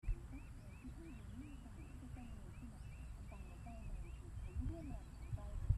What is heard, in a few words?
ambience birds, Vietnam; 2019